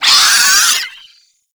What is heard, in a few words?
Arm Vocal Wind Up; Enemies; Warframe; ManInTheWall